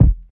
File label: good kickdrum sound e key, Royality free bass drum sample tuned to the E note; Loudest frequency: 108Hz